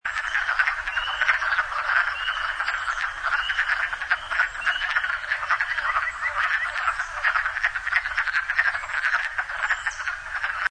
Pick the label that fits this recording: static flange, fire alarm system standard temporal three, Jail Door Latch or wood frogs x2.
wood frogs x2